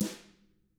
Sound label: TAMA, Drums; ILIO; Double Platinum Drums 1